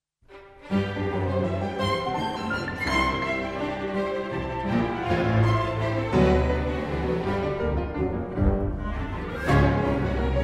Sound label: Piano Concerto; Prokofiev